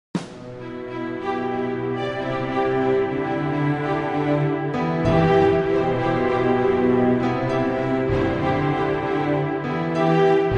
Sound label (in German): Orchester